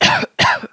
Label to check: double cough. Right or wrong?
Right